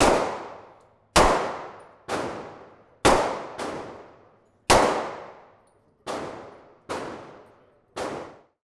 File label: Big Gun Fast Shoot Out; Nova Sound